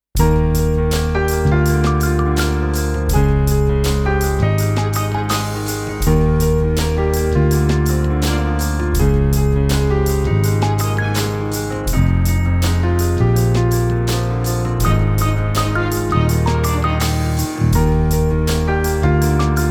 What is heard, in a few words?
instrumental